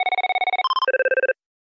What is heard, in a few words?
Office Phone